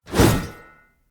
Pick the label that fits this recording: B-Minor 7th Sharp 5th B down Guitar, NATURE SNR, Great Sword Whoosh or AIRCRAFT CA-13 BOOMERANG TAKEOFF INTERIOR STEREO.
Great Sword Whoosh